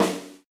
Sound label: RX8 SD, Yamaha RX-8